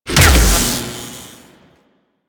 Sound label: Shocker Explosion; Spec Ops Shocker